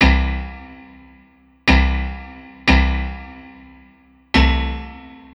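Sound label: Toms C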